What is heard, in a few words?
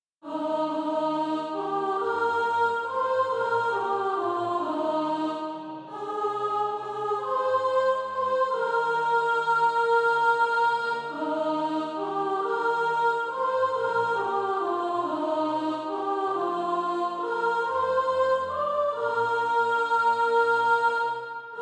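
soprano, Practise Music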